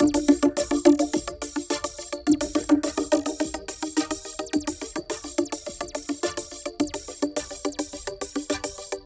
synth arp; Electrocution

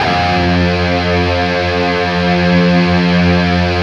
LEAD F 1; East West; Steve Stevens Guitar Samples Collection

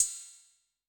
Ride, Cymbal; Yamaha DTXpress-II

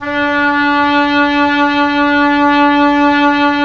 Oboe; Roland - LA Composer vol. 4

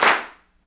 CLAPS, Africa Handclaps

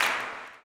CLAP, Blues Soul; Spectrasonics Vocal Planet

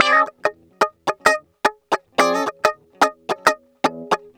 Guitar Loop; Ambient Grooves

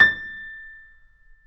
Mf A 5 off, Grand Piano off mic; AKAI S6000 CD-ROM - Volume 2

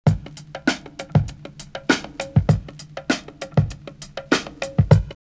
Soul Loop, drumloops, OldSchool; X-Static Goldmine

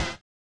collision; Spectrasonics; Metamorphosis

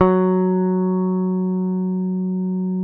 F#3, Bass; Sampleheads; Will Lee Bass Library

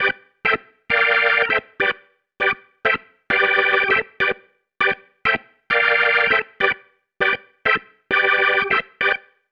Pattern, Organ

